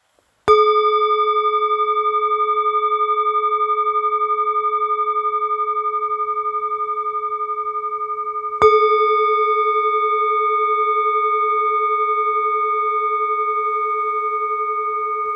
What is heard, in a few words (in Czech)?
373 chopa, tibetska misa choepa 373 g